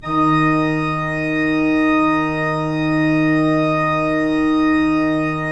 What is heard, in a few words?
PIPE, 3DOrgans; E-MU Producer Series Vol. 5 – 3-D Audio Collection; 3D Pipes Dr Joyce